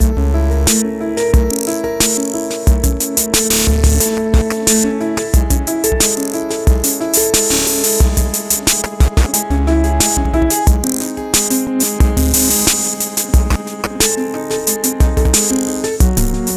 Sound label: piano